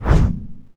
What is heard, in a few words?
180 turn